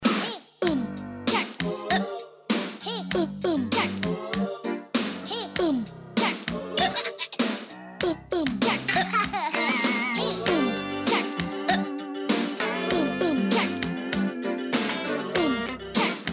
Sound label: Bebe Funk, phone Sonnerie